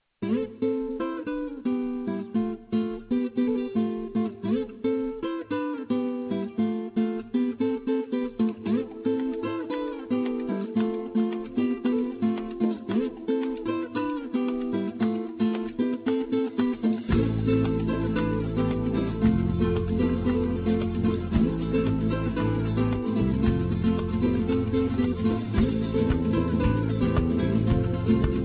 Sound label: spanish, ringtones